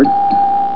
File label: ding ding